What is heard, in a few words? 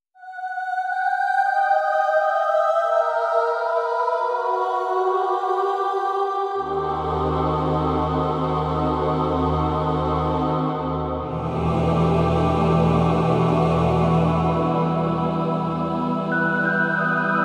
Horror Sound Music